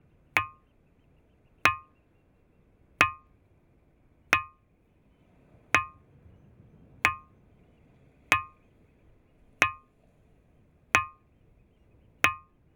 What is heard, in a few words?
bell stone; Isle of Iona; St Orans Chapel; Rock gongs, lithophones, or ringing rocks; Rock gongs, lithophones, or ringing rocks are found worldwide and are large, often stand; Adamnans